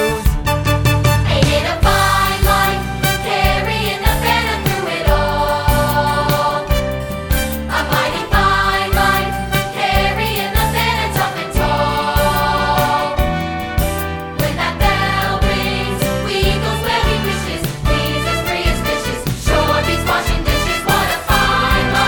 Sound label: Audition Guide Vocal; GIRLS AUDITION TRACKS; William G. Houston Middle School